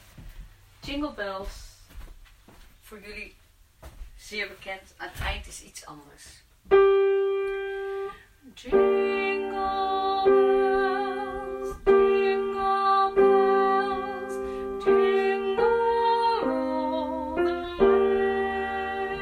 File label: Tenor